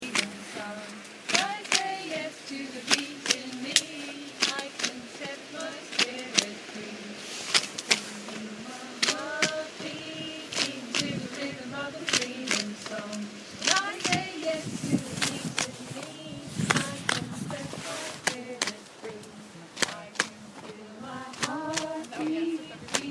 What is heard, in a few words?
Song